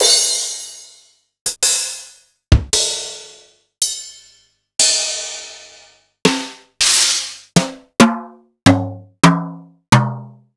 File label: Akai MPC500 Brush Kit